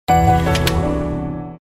Close, Event sounds; LG KF900 Prada II

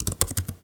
Keyboard Presses, notifications; Oppo Find X5

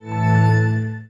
charging started; Nokia; 770 Internet Tablet

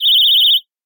absurd bird, notifications; Google; Google Sounds; Play It Loud

